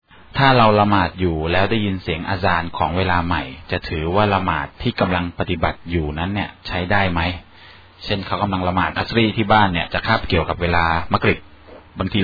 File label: salaat azan